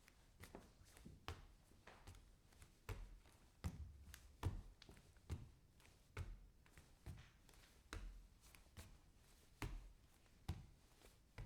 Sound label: bare feet walk on wood